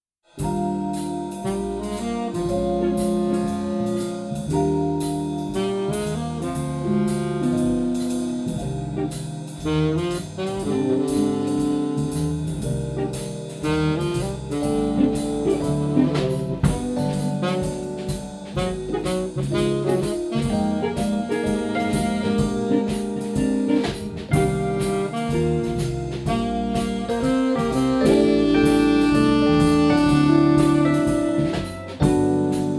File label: alt, jam; december thirty jam